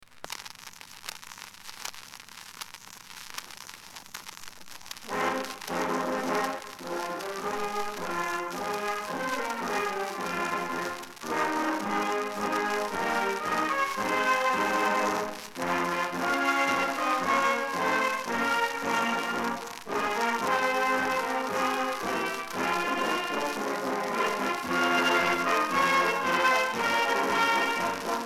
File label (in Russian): Духовой оркестр; ДК Ленгороно